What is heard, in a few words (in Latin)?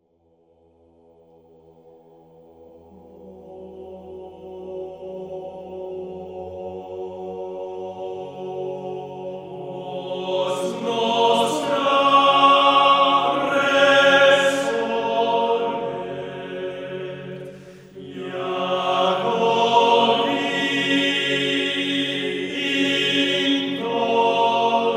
Vox nostra resonet organum